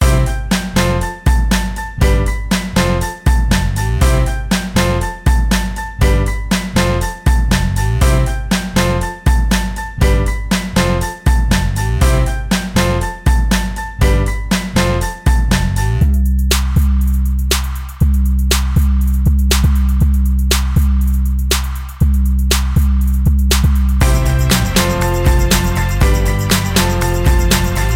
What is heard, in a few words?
background; Alvin and the Chipmunks - Holiday Hurry